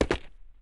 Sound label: jump, footsteps hard surface